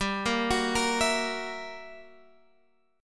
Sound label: G Minor Major 13th, G, Guitar, Open G